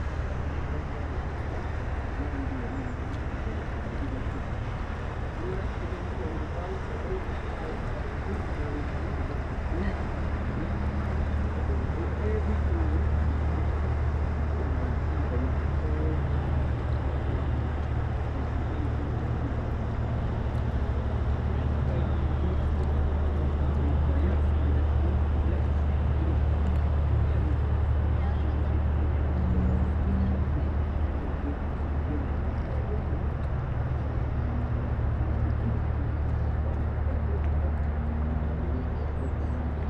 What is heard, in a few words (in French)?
paquebot cree remous